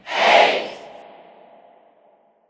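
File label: Ambience Tennis Crowd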